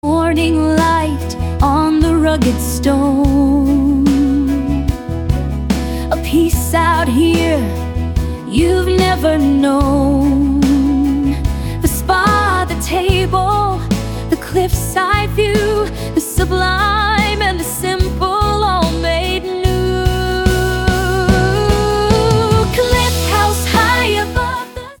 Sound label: ringtone; cliff house maine